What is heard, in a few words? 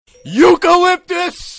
eucalyptus notification sound